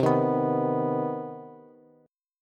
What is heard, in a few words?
C Minor Major 7th C Bass; strummed; JGuitar